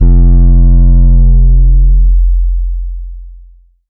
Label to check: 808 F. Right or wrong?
Right